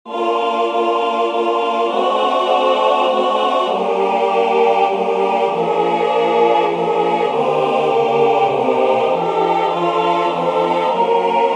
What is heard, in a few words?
Ensemble